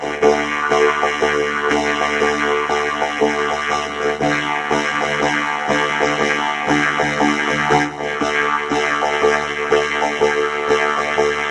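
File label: sitar monotonous vibrating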